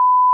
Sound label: Dah, morse code